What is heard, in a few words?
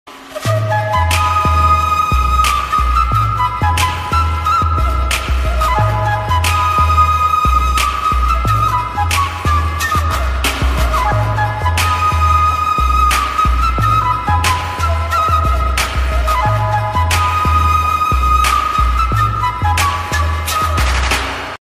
villain bgm ringtone; kathi; khalnayak; dada ringtone; chhottu dada